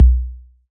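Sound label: crunchy kit kick; STYLE KITS (Hip Hop, Etc)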